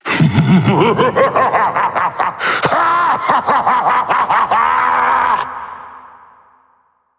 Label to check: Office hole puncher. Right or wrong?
Wrong